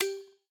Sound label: button click; netent; sweetyhoneyfruity